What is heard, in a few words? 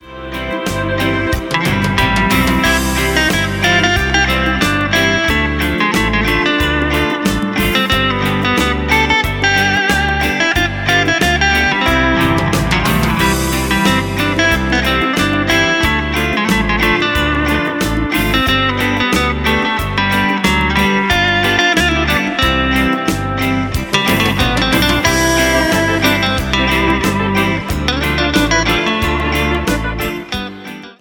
Guitar; morch pickups